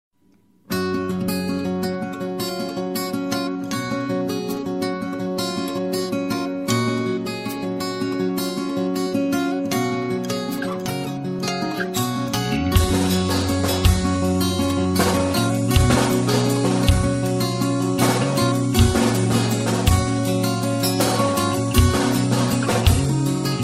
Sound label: Guitar Solo